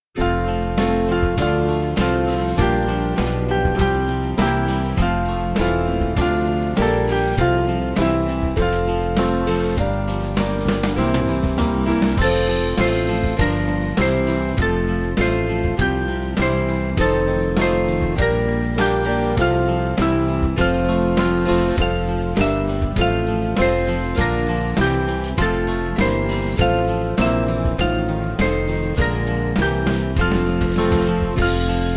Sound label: O Bless Our Family, Vesper Hymn, Band